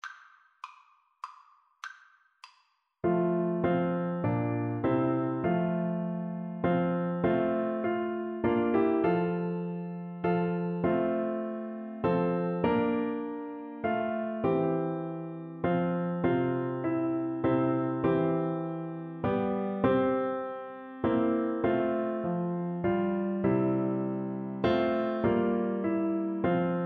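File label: recorder karaoke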